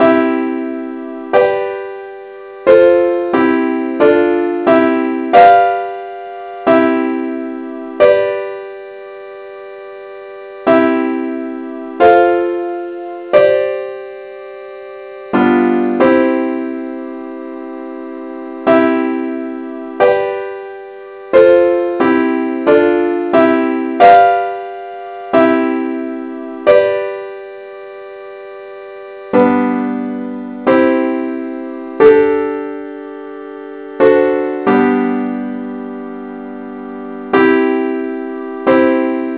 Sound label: simple Acc key c; Gwalchmai; Glory be to God on high